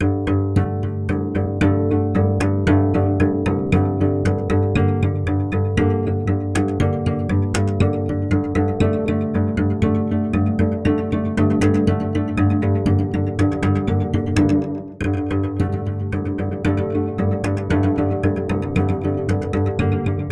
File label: cello col legno; septimbral